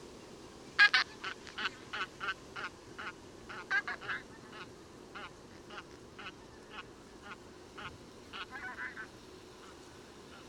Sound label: tunbgoo rossicus FCD HONK EC CHUCKLE; linum